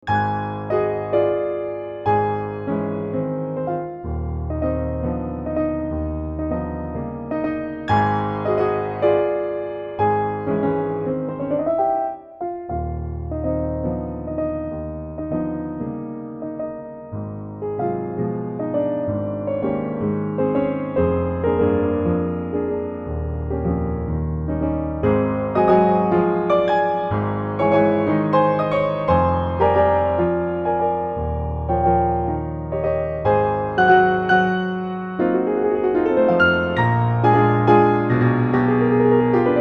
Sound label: Chopin - Ballade No. 3 in A-flat Major, Op. 47, pianoteq